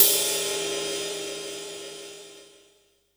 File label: large room drum crash sound G key, Royality free crash tuned to the G note. Loudest frequency: 8442Hz